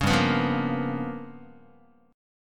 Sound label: G 7th Sharp 9th Flat 5th, Guitar; G7#9b5 strummed; JGuitar